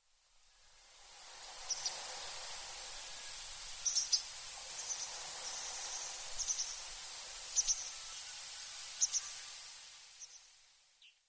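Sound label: hojirohaku, Pine Bunting; yonaguni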